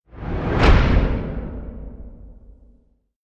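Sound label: Hit Swoosh Whoosh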